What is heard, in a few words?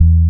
Elc Bass; Equipped Music